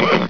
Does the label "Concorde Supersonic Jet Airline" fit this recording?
No